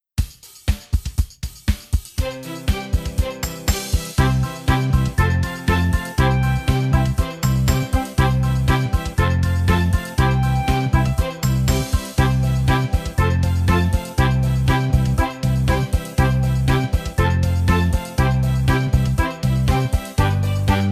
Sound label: Backe backe instrumental